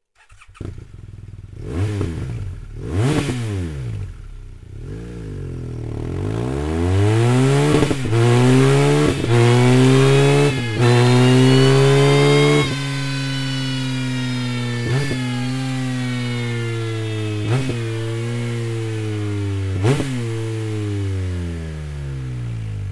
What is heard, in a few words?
akrapovic slip on exhaust bmw r1200 gs adventure